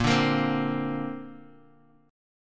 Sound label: A sharp Major Add 9th, Guitar, Open F; strummed; JGuitar